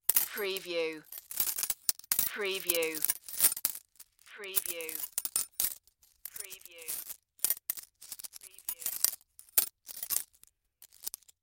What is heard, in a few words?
Coins Moving in Hand